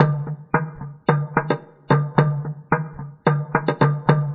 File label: Acoustic Percussion